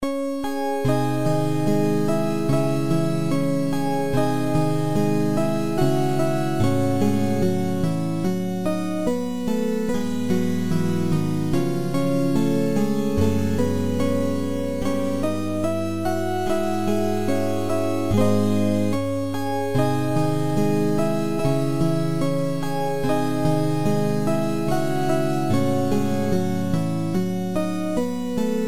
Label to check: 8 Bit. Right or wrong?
Right